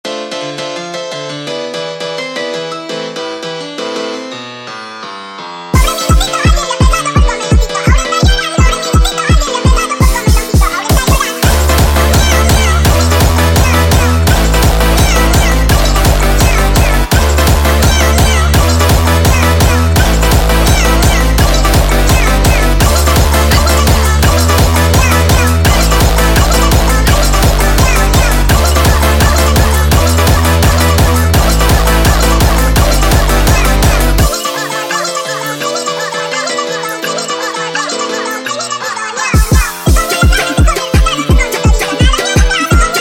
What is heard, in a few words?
Funk nightcore